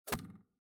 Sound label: hit